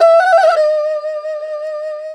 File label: ERHU LICKS